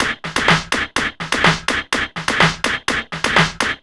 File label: somewhat annoying, Drum loops